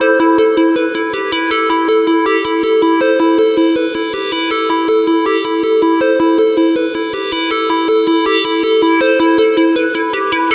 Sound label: Cutter